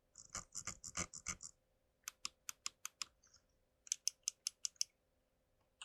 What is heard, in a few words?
scroll DPI; SA3W